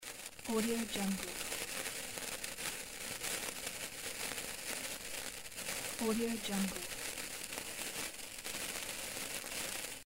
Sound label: fuse